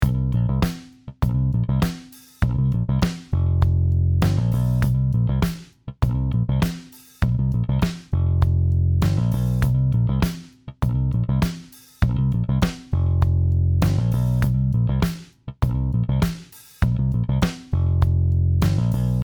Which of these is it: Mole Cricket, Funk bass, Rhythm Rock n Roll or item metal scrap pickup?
Funk bass